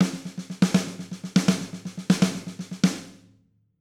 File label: Triplet Fill, drum